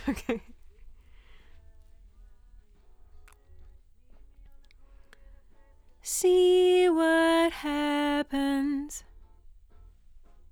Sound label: LdVOX Double